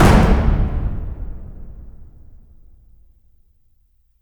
Fail

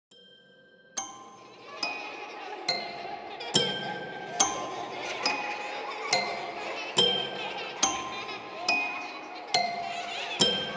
Horror Toy Piano With Kids Laughing

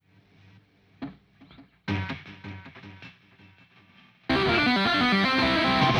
Music Down Beat Guitar; GnomeGnight; Plants vs. Zombies Battle for Neighborville

